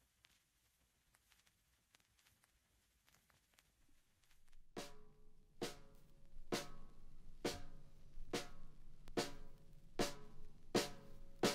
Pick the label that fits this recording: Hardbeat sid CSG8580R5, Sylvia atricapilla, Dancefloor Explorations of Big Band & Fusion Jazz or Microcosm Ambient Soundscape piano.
Dancefloor Explorations of Big Band & Fusion Jazz